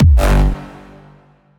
Reverse Bass

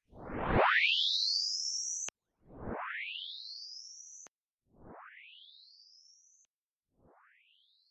You Gain Strength

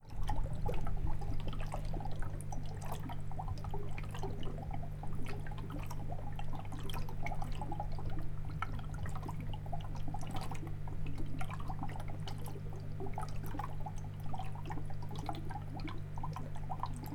Boiling Liquid Retort In Action

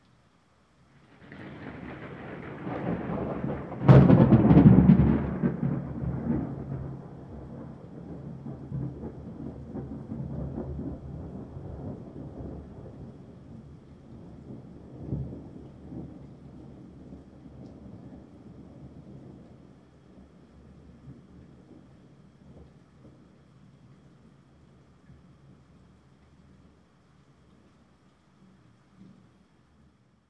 Huge Exploding Thunder

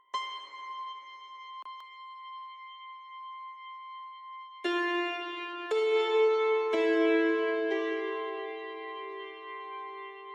Angelic Theme

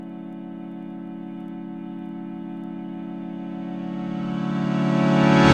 Reverse D Chord

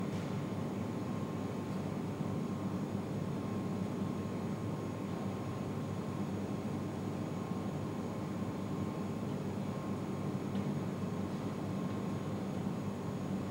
Roomtone Empty mall Indoors

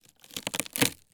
Wooden Debris Field Recording

